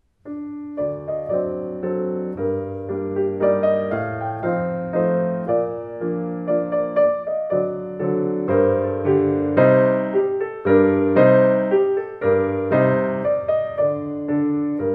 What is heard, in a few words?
Mazurka in G Minor